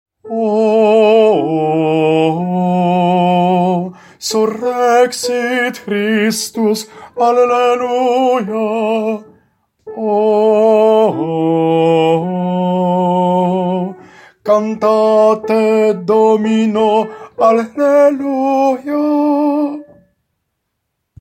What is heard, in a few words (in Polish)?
TENOR; Surrexit